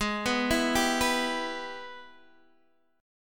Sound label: Minor Major 7th Sharp 5th, Guitar, Open D; Gsharp; x,11,9,10,10,9